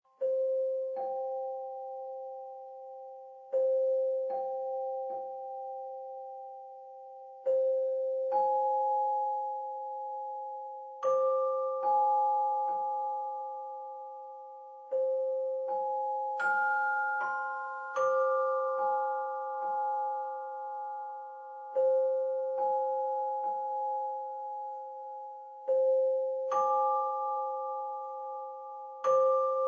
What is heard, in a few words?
Celesta